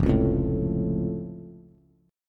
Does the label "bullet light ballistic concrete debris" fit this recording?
No